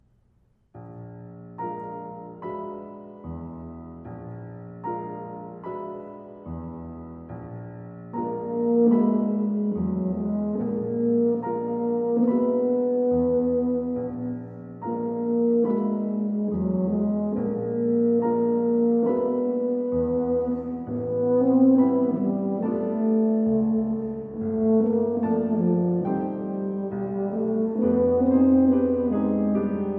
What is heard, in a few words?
Divertimento for Horn, Tuba and Piano - Andante